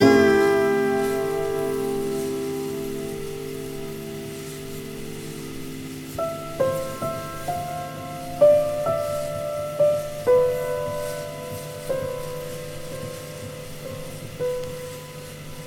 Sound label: mind noise